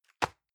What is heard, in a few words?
envelope drop medium